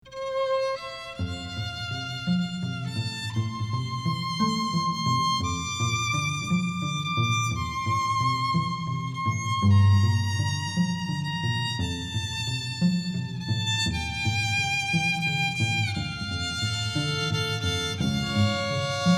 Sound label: Wedding Ceremony String Duo